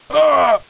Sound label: death; cstrike; codlife